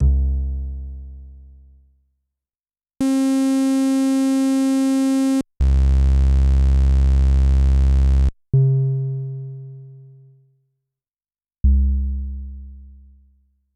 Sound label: Yamaha DTXpress-II Instruments